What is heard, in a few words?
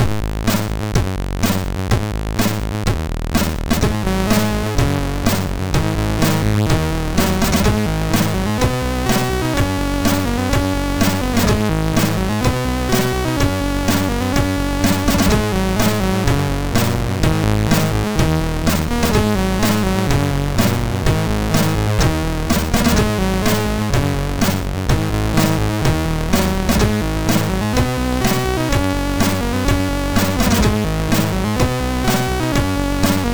MOS6581R3, GAMES; Shellshock